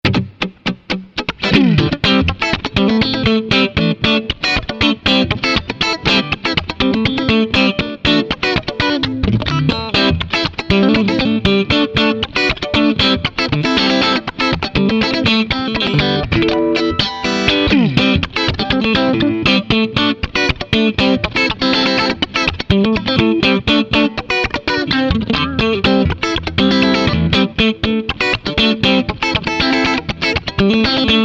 Framus Diablo

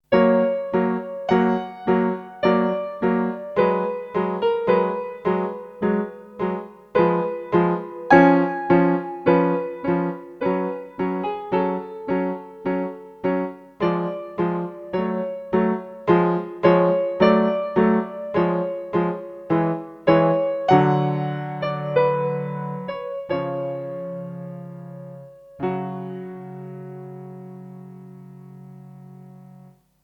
rom acoustic piano

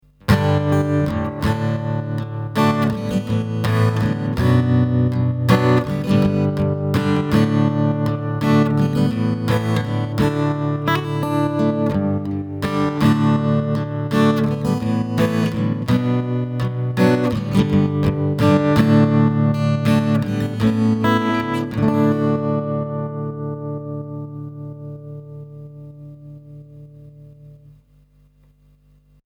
ZOOM AC-3 output Tremolo

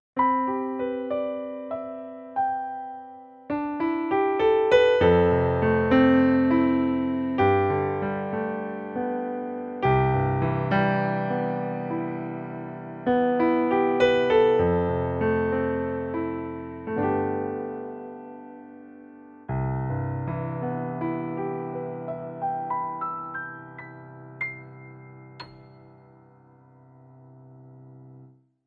Yamaha CP88 Piano

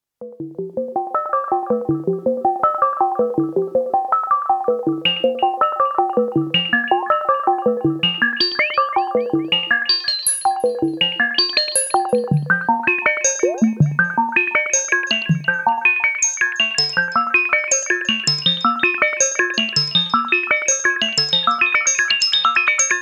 ARP 1601 Resonance

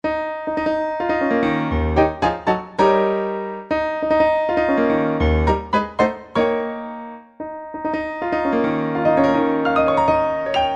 HP507 Concert Grand; Thomann Demo

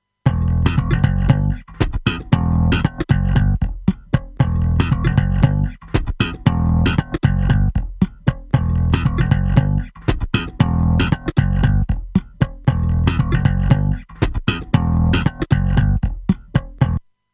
Bass Original

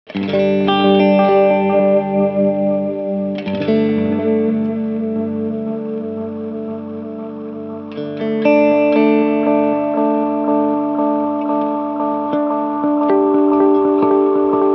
warp

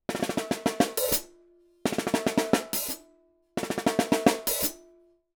Snare Hihat Samson CL 2

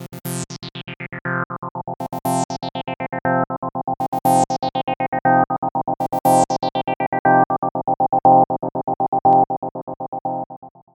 Stereo Gater

